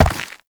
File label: Step Dirt; Warframe; Uriel